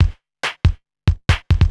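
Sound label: DRUMLOOP ELECTRO 140; Techno2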